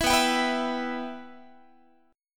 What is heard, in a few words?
B Suspended 4th Sharp 5th B Guitar; Bsus4#5; strummed